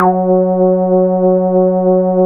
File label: RESO F#2, BASSES; Best Service Alpha Dance vol.2